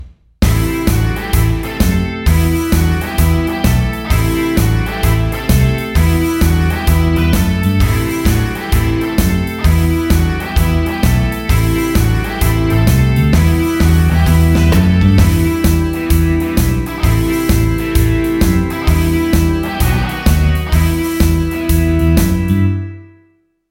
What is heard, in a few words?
Em Riff Rock 130